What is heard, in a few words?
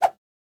Spawnin Whoosh; Boomerang Fu